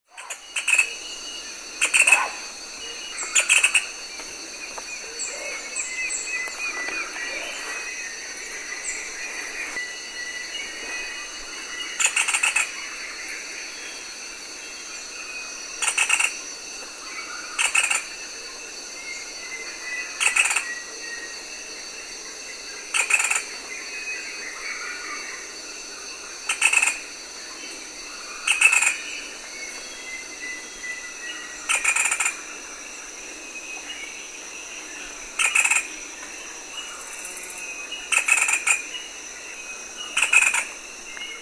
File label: Funisciurus cf lemniscatus mayumbicus; Mathias DHaen